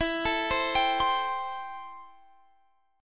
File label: A-Minor 7th E